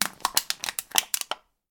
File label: crash empty can